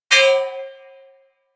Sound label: Bullet Impact Debris Pipe